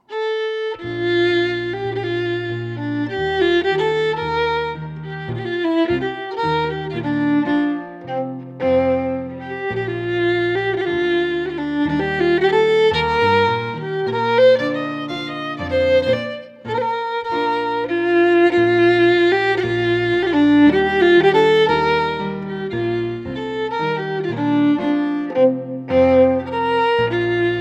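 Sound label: Strathspey, Scottish Fiddling; Morrison 1797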